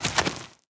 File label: dig clay